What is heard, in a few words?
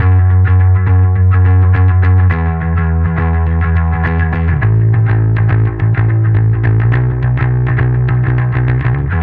Bass